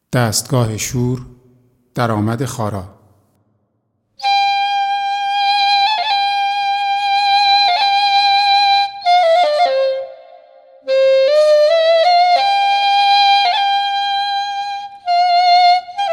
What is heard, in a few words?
shur daramad; Radif-Jahangiri; khara